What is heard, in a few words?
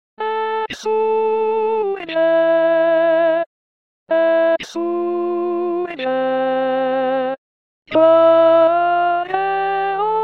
exsurge alto